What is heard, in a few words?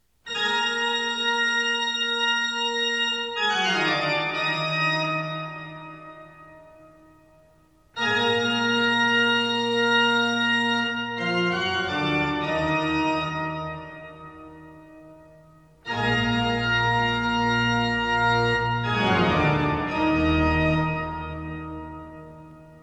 Scary Organ Music; 1001 Sound Effects; Halloween Scary Music and Sound Effects